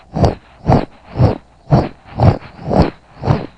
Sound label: drop Troops; GroundCombat